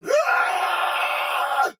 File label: Death Soldier Sword Agent Male, Battle; Dialog; Barks; Melee; Sinecure